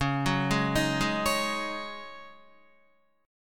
C sharp Suspended 2nd Suspended 4th, Guitar; arpeggiated; 8,9,8,8,8,9; 4F,4A,5C,5G,5C,6E

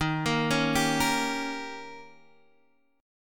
D sharp 7th D sharp, Guitar Half Step Down

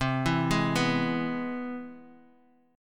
C Augmented Major 7th, Bass Standard; arpeggiated; chord; JGuitar

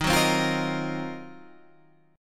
Eb Minor 6th Add 9th Eb down Guitar Standard; strummed

